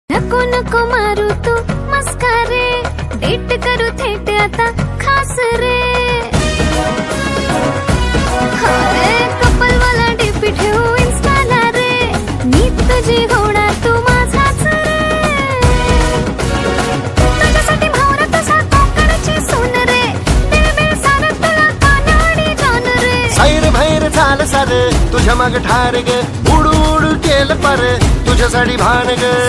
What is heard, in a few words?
Marathi Ringtone